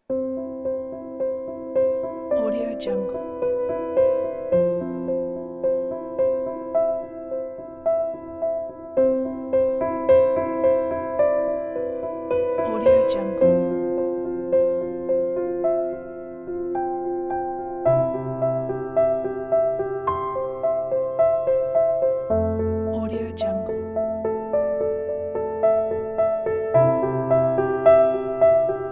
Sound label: this is solo piano